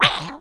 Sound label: spooky, notes